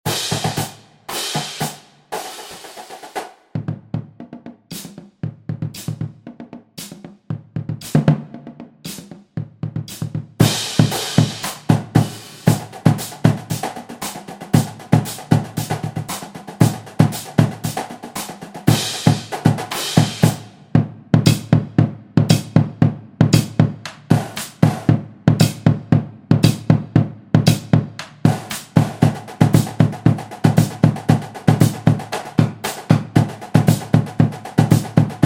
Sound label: modified cadence all Parts